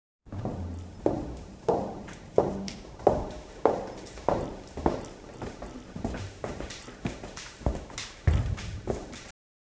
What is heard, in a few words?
sounds of footsteps walki, boots; sound effect